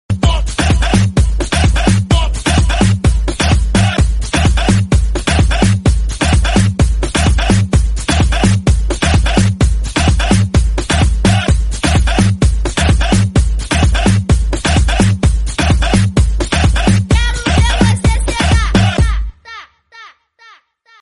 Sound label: iphone 8 plus french ringtone